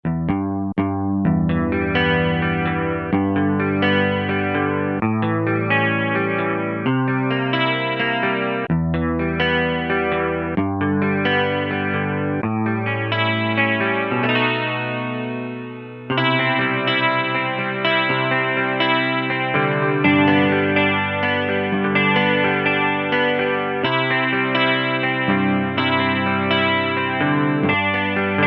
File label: Strataguitar, Kurzweil K2000; Kurzweil K2000 Contemporary ROM